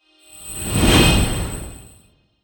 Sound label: promo pad